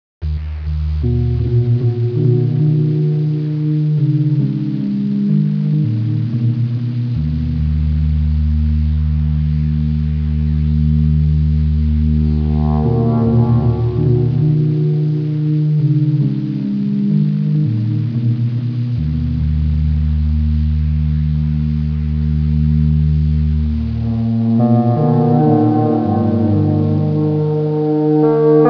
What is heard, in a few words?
ambience music